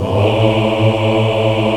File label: VOX, African Aahs; Roland LCDP11 Africa VOL-1